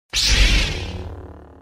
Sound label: Saber Hit